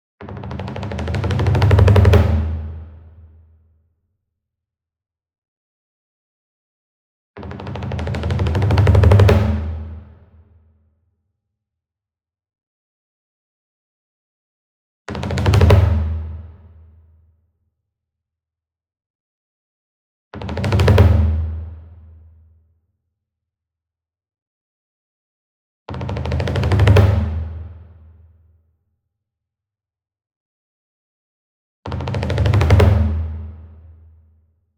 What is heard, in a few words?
SINGLE DRUM Kodo Roll Hard Beater Medium, Cinematic Strikes; boom library; Cinematic Strikes Construction Kit